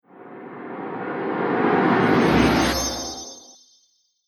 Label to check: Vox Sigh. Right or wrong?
Wrong